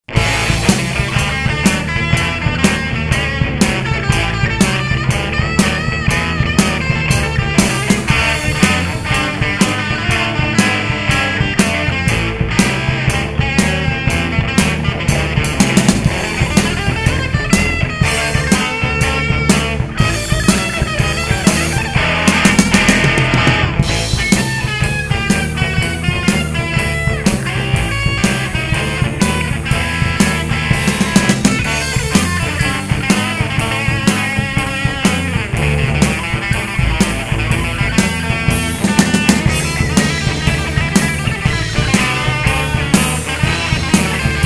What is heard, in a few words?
instrumental